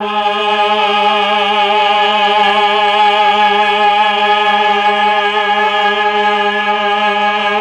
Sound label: CHOR; ILIO; Propeller Island Legendary M400